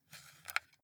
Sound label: console controller xbox joystick move soft